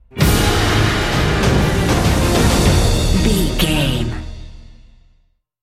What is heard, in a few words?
epic adventure sting bumper